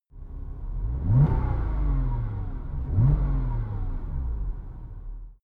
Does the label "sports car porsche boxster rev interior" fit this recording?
Yes